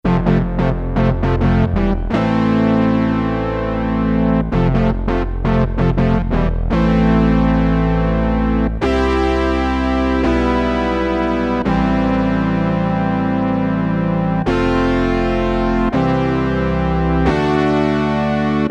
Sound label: HARD BLIP, Roland Jupiter-8, Factory patches